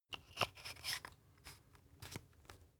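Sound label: toothpaste close, Bathroom; haus-garten; badezimmer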